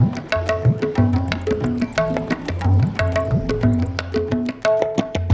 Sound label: Ambi Groove